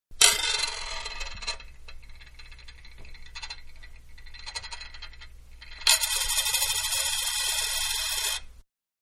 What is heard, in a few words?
Dime Spun On Glass, Coin; Money; Manhattan Production Music